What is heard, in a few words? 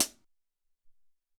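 Closed Hat, Alesis SR-18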